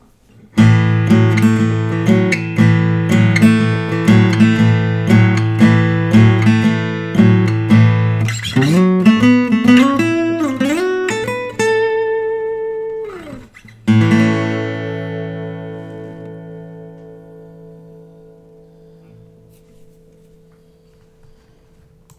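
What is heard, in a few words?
gus creek short scale; 2008; john walker; sunburst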